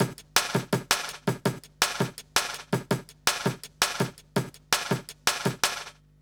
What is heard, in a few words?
165 break, Explosive Jungle; USB Soundscan vol.05; AKAI; 90s sample CD